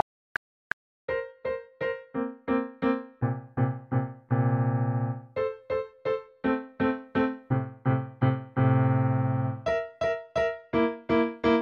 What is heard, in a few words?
Chopsticks no metronome